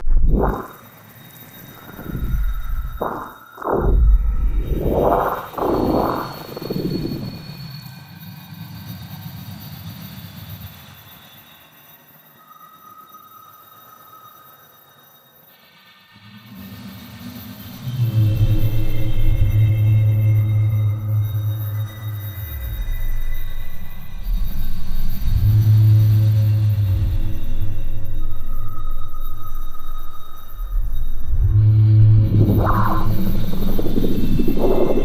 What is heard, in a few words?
modular